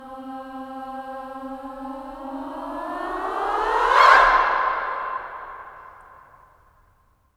FEM SWOOP, SWOOPING

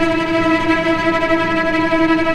VCS TREMOLO, string; East West - The Ultimate String Collection

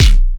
Kick, dry perc; Quasimidi Rave-o-lution 309

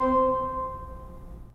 Pipe Mix Celeste, 3D Organs

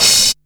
HH OPEN, Fresh Disco House; USB Soundscan vol.20 - Fresh Disco House I

